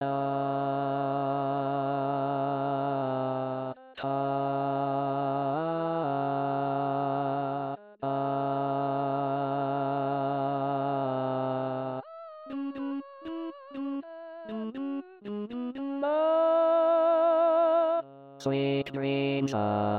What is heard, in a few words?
tenor bars